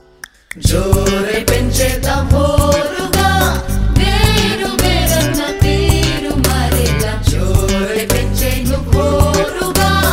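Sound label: Telugu Ringtone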